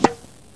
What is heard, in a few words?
SERVE